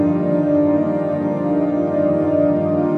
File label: pad